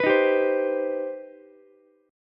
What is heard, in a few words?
C Minor Major 7th, Ukulele; strummed; JGuitar